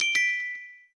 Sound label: notice; freetel